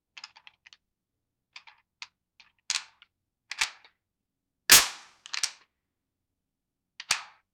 gun holster

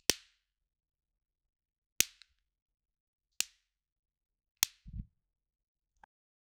the sound of a whip cracking sharply in the air; tools

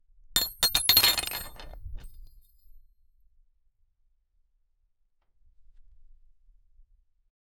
the concrete wall starts; architecture

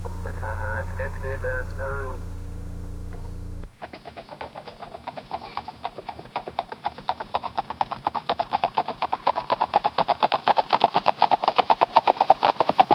a horse galloping and nei

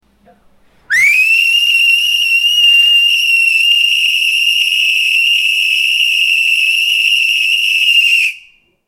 referee whistle for start

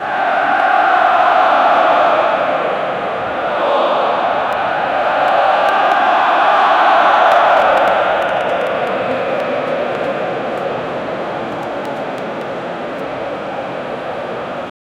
sound of soccer stadium r

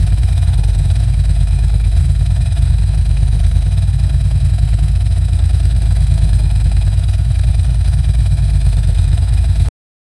low deceleration formula, formula 1

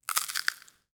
asmr

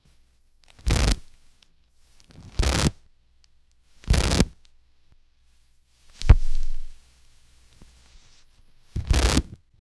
cinematic foley of body, armor

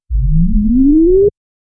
sound of level up

